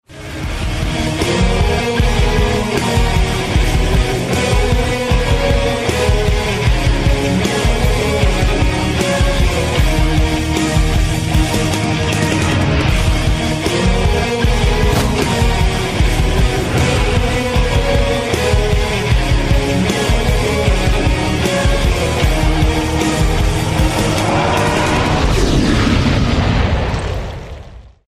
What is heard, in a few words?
hard music, rock